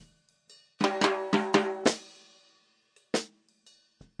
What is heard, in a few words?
snare mic, drums